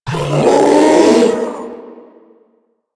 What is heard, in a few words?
Strike Scream; Enemies; Abilities; Warframe; Proto Infested Ancient